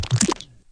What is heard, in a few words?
tar; tiki kart 3d